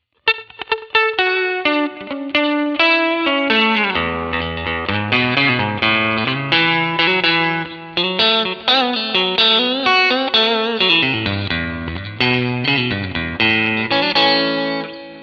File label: clean; AVn-Trad Mk-II